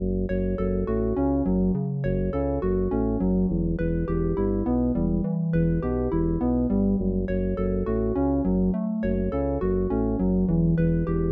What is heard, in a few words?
Dungeon